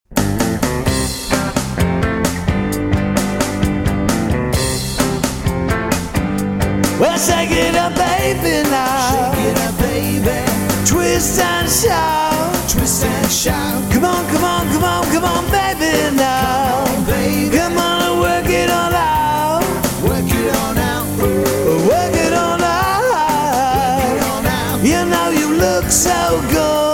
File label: Rock